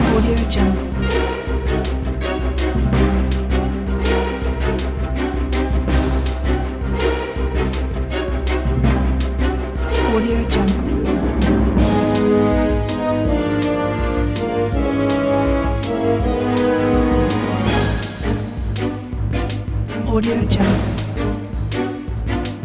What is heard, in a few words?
final boss loop